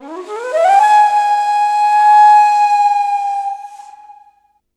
Flute

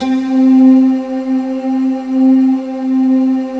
PIANINO C3

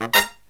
HI CHORD, Brass

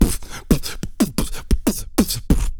knockout; Spectrasonics; Planet

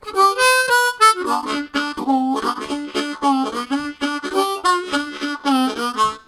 Vamping Walk, Harmonica; Zero G

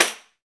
TAMBO, Tambourines

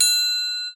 TRIAM; Xsample VOL-9

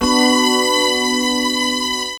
pads E; Zero-G; Total Drum Bass

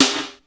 Gated Snare, Ensoniq ASR-X Pro

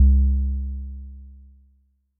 Bass SIN L, Yamaha DTXpress-II

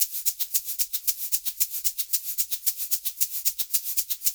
LOOP, SHAKERS, Percussion; InVision Interactive; Percussion Slam

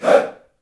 HEY, SHOUT; Spectrasonics; Heart of Africa 1; CH. SHOUT1

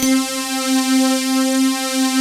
DYNATES, pads; Pro-Rec - Synthsonic

